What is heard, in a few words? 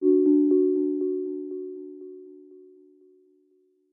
fading ping pong, homepod